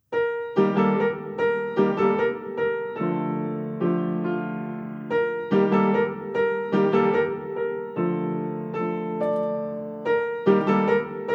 Violin; 見晴台まで

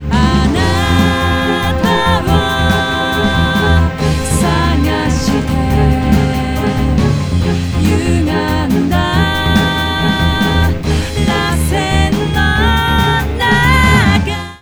LOOP

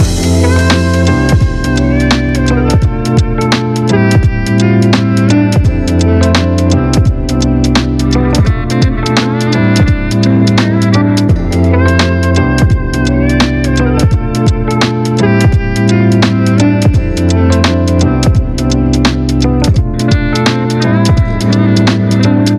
Lo-Fi; MATSU-BEAT

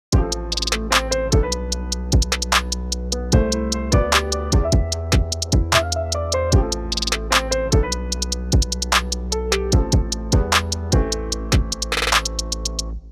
trap song

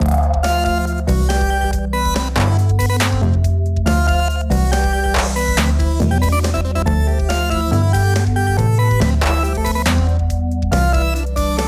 Laser Poppin; 白めの鯛焼き

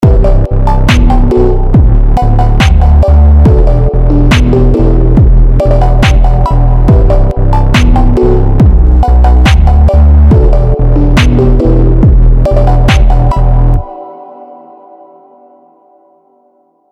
phonk

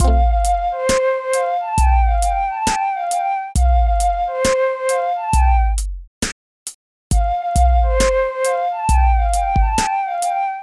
Tape flute

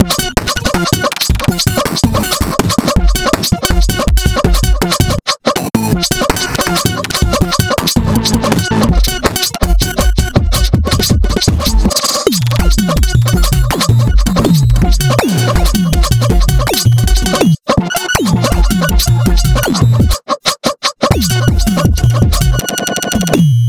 Error Effect; Fasten

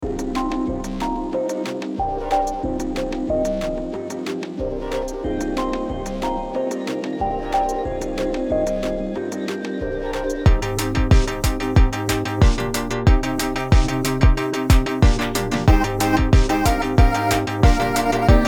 bubble

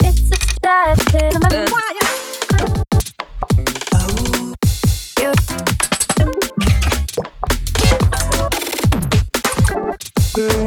Drum n Bass

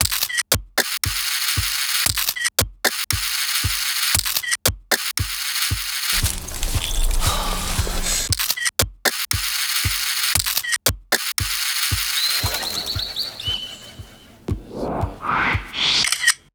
shutter chance

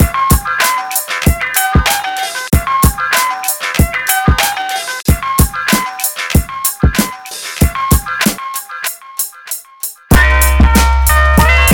beats

